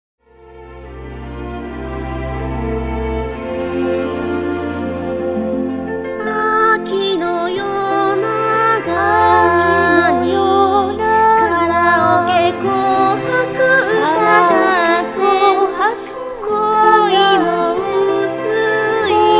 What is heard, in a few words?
jingle